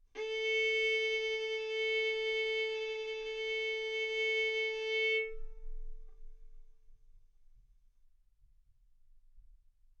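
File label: Violin arco mf A string A4 B4 stereo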